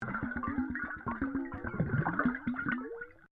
UNDERWATER BUBBLES SMALL, Bubbles generated using a bicycle pump underwater